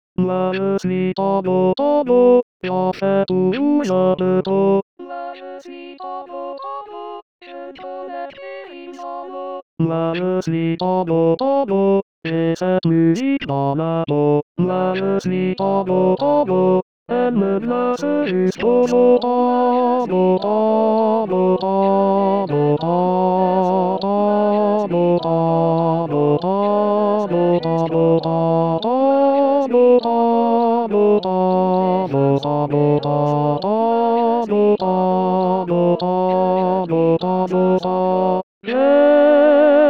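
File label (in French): tango ténor voix accompagnement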